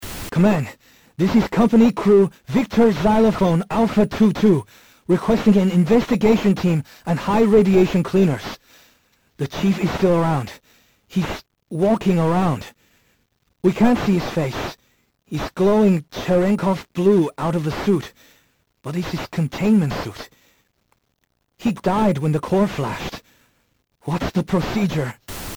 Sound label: Distress Signal; Mothership; Mothership Distress Signals v1